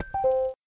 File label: login; fchat-rising